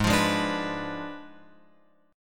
G sharp Diminished 7th G sharp, Guitar Open F; G#dim7 strummed; JGuitar

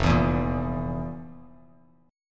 F Major 7th Suspended 4th F, Bass Standard; FM7sus4 strummed; JGuitar

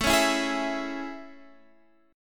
A Major 7th A, Guitar, Standard; AM7; x,12,11,9,9,12; strummed; capo=2

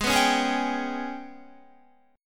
Ab Minor Major 7th Double Flat 5th Ab, Guitar; strummed; 4F,4A,5C,5G,5C,6E; 15,14,13,13,13,15; JGuitar

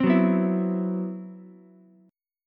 C Major 7th Flat 5th, Ukulele, chord; CM7b5 strummed; JGuitar

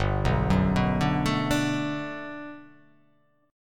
G Major 9th, G, down

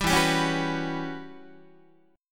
E Minor 6th Add 9th Guitar; Em6add9 strummed; JGuitar

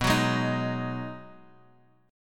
B 6th B down Guitar Standard; strummed; capo=2

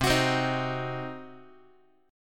C Diminished, Guitar, chord; strummed; JGuitar

